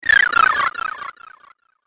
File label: Cartoon Magic Spell